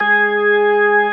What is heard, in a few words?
B3 G#3, organ; AKAI S-Series CD-ROM Sound Library VOL-8